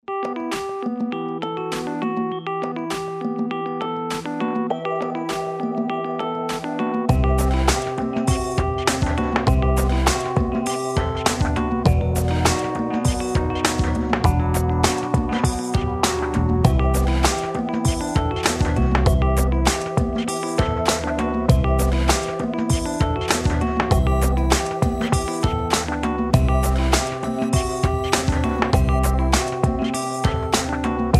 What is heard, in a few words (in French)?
PIANO RAPO ROMANTIQUE